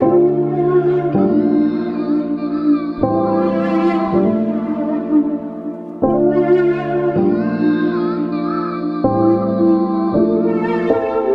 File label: melodic stack D min; citrine